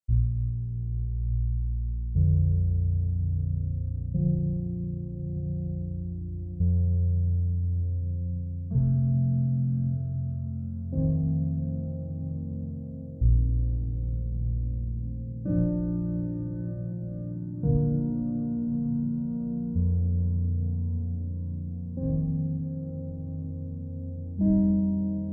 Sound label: Locked